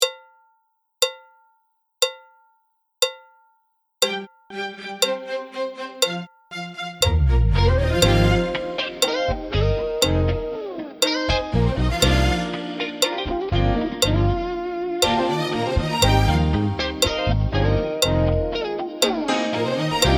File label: Drum Solo Playalong; drumsolobooks